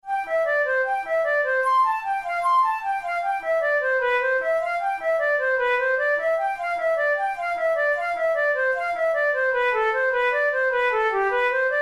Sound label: burgmuller op100 la candeur, flute